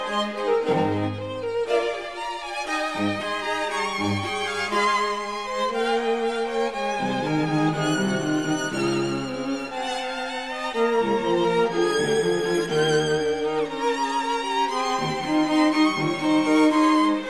Fugue for 2 Violins, Viola, and Cello in B-flat major; Meno mosso e moderato; String Quartets; Beethoven